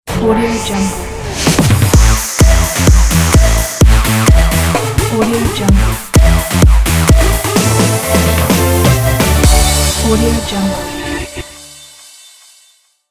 edm jingle logo

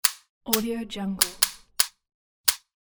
gun out of ammo

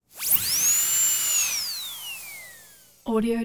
dentists drill; prosoundfx

